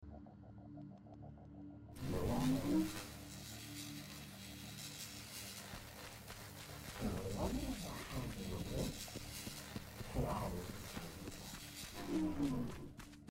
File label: tesla coil; risk of rain 2